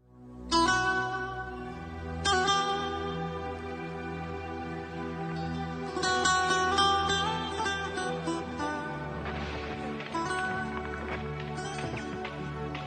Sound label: Vocal